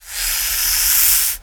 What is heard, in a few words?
Play Snake